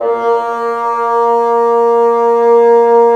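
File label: WND BN, wind bassoon; LA Composer vol. 3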